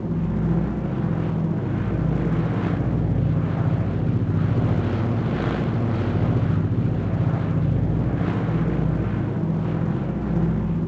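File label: traffic steady loop; Star Wars Obi Wan; XBOX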